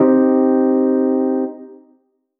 LoFi Piano Fat Channel; Creating LoFi Piano

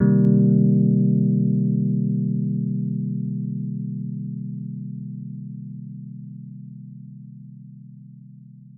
C Min 7th

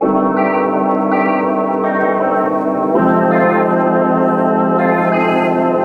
Light LoFi

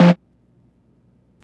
LoFi Snare Vinyl

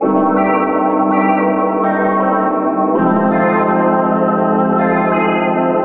Send bus to reverb to Light Lofi

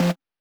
LoFi Snare Bitcrusher

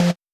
LoFi Snare Compressor

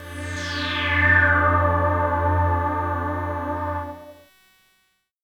Pad Chord; CRAFTsynth 2.0

